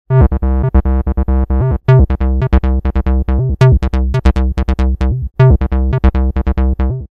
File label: synth mono, ring; wap-terminal